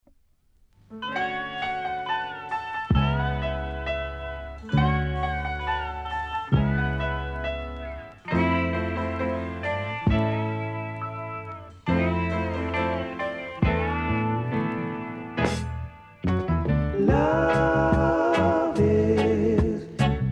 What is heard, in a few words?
REGGAE DISCO ROCKERS